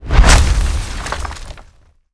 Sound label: Weapons Swing